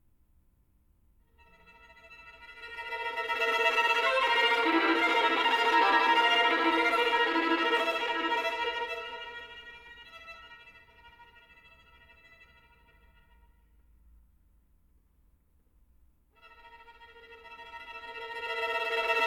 augmented throbbing, orchestra

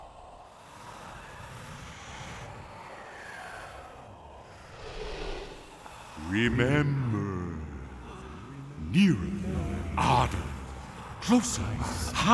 baritone

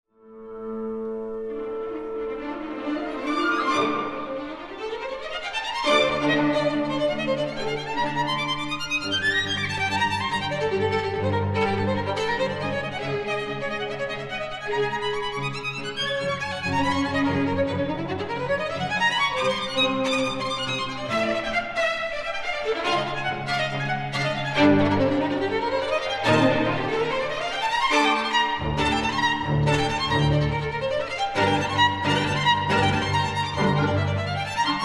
violin con mvt. iii

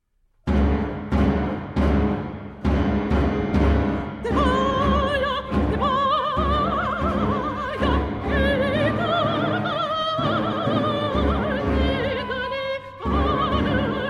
folk songs

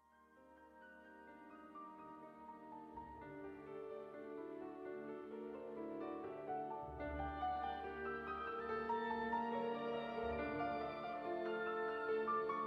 piano concerto

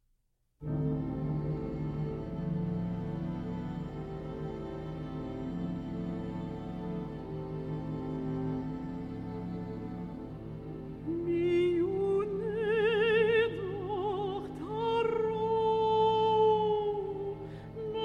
folk songs

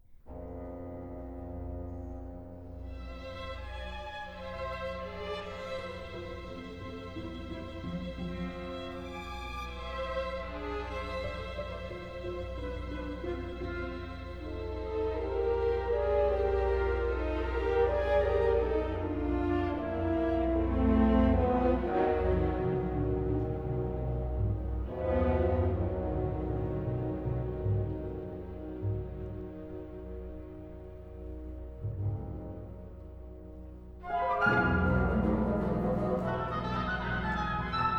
interlude, winds released

